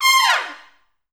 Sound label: C5, Pop Brass; Best Service; ProSamples vol.19; AKAI